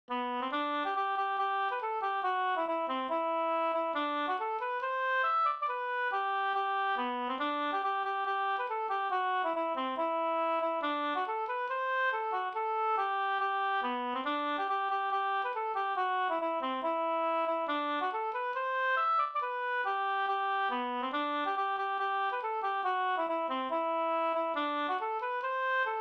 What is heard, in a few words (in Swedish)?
schottis; Thureholm Sd